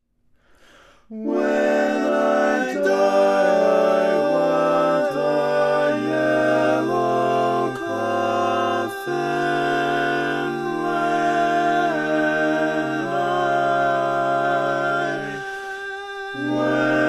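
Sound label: baritone, barbershop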